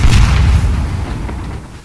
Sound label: air exp; observer